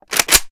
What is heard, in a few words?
reload empty charge, weapons; wfoly ar; viper